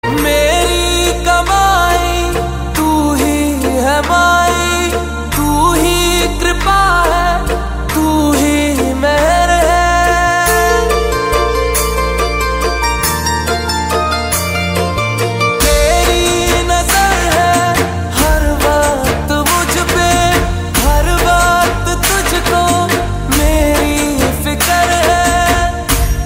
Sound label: Bhakti